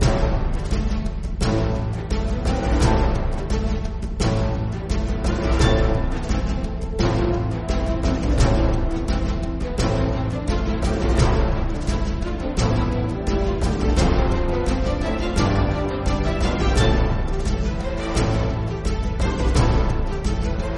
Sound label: combat; musique